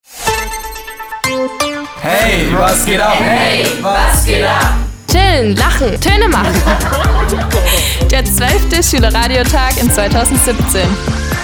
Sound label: Jingle Opener; Workshop6